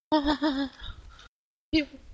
orgasmus

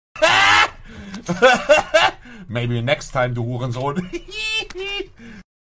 lachen

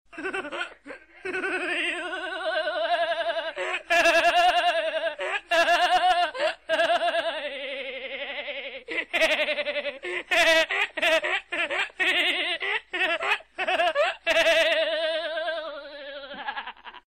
lachen

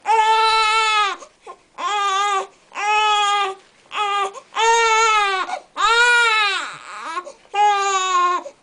Babygeschrei

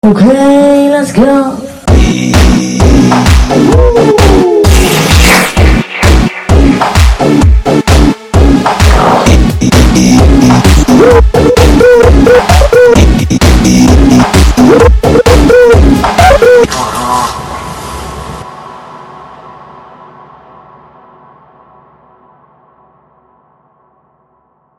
ihhhh musik

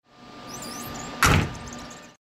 lkw tuere

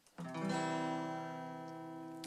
Gitarrenakkord

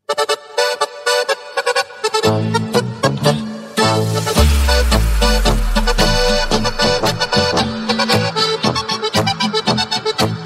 tiroler polka

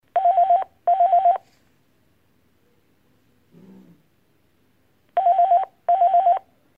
ep tel intern

